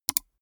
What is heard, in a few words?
gaming mouse left click single fast soft; maschinen; buero